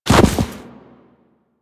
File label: Walk Deep Snow, Footsteps; Warframe; Operator